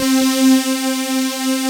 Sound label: BRUSH SYN; Pro-Rec - Synthsonic